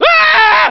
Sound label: scream guy; Voice; halflife